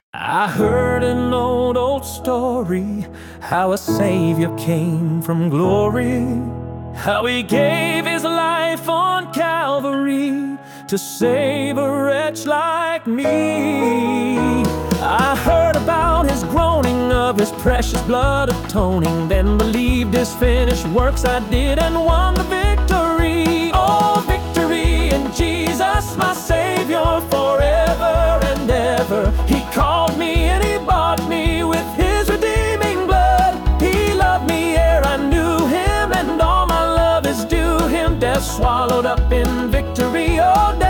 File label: Male Voice, Piano, Hymn, Upbeat; Victory In Jesus